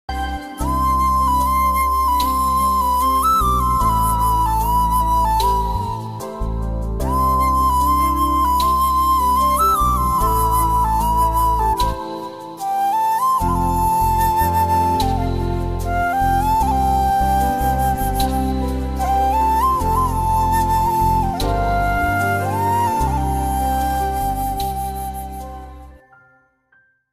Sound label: sweet flute music instrumental evergreen ringtone